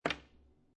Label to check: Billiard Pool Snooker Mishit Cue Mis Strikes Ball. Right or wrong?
Right